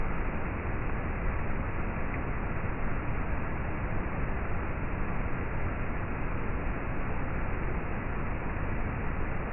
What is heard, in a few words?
Falls, magic and spell sound effects